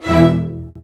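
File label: String Section; CMI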